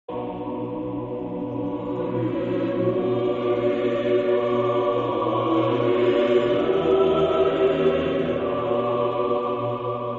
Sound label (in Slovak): aliluia hlas 8